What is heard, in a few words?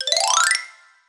Xylophone; Orch Perc and Stings; cartoon; musicradar; cartoon caper